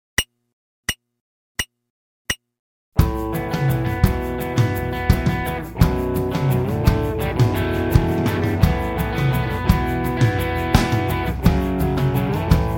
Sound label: modern rock